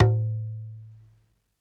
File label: conga; eddyflux; dough-samples